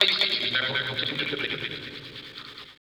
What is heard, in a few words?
techno vocals 140